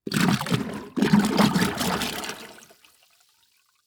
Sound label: Liquid Water Water Jug Fill With Water